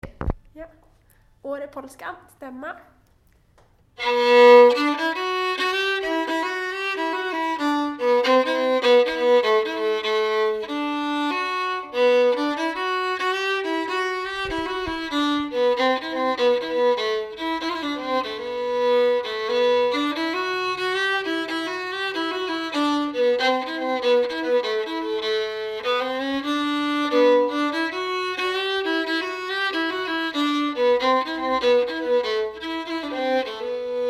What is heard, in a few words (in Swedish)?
polska stämma; repolska